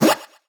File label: Retro FX; Cymatics; Video Game Sample Pack Vol 1